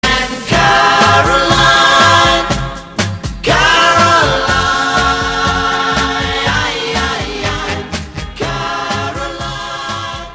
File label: tune; Radio Caroline; WLLKS Pirate Radio; Het was de eerste zeezender voor de Britse kust, die tussen 1964 en 1990 uitzond vanaf verschillende schepen op de Noordzee.